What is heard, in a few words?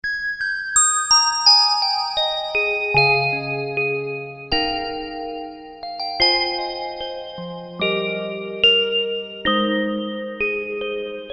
Glassy Bells